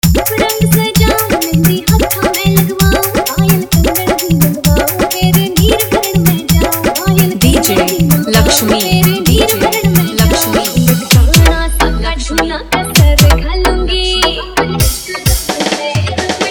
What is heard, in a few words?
Hariyanvi Mix